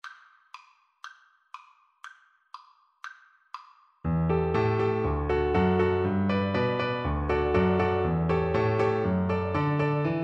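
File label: viola karaoke; Offenbach, Jacques; orpheus in the underworld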